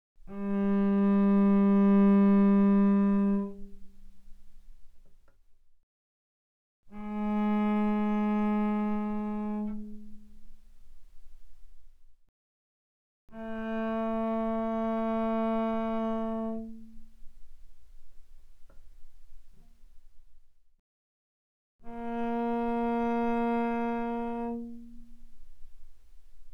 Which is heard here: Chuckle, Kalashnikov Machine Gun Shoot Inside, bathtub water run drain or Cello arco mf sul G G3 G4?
Cello arco mf sul G G3 G4